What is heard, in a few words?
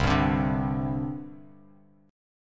C Suspended 2nd, C, down, chord; Csus2 strummed; JGuitar